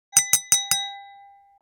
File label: Glass cup tap call to attention